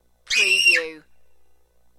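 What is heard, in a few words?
Little Girl Scream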